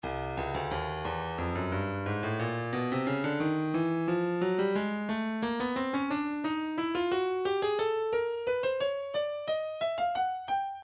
breakbeat 089 bpm; Stylus Breakbeat Midi Files; bombadier c

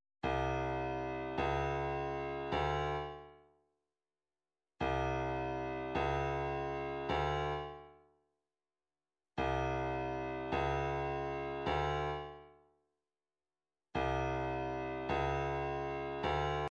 105 snare

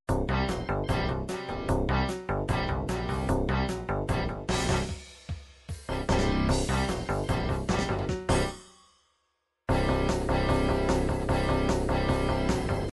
pop upbeat pop

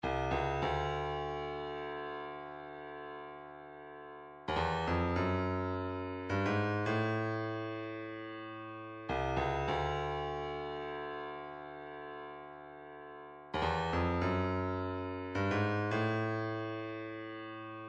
cowbell fx

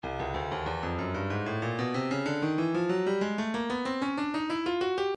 stylus breakbeat midi 094 bpm

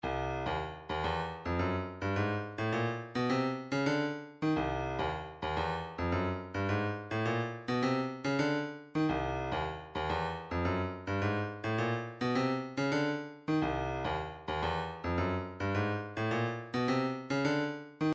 106 triangle, midi

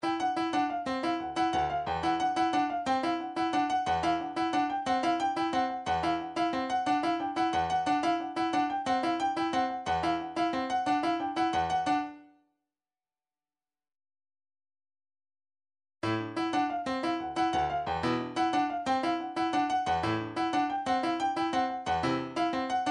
midifiles old school 120 bpm

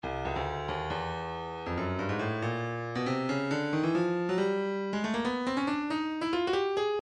breakbeat midi files 130 139 bpm 138; Stylus

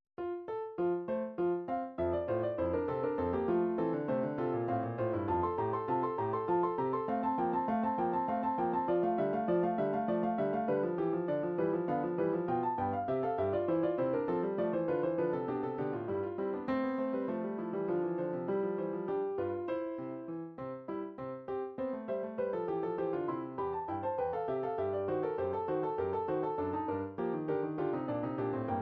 piano, midi; piano version of bachs two part inventions

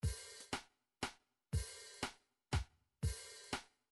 midi drums, waltz

